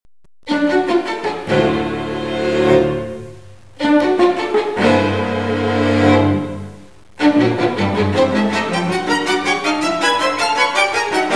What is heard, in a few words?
String Quartet